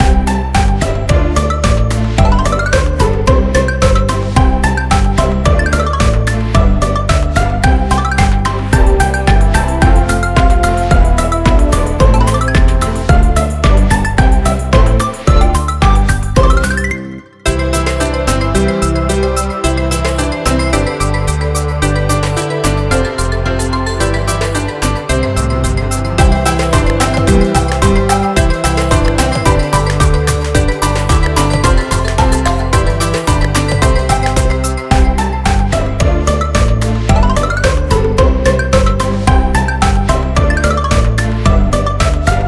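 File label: vowels, letter Y, karaoke; glasnyye bukvy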